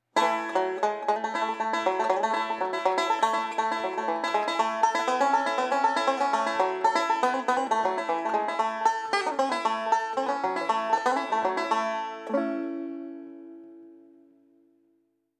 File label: bluegrass banjo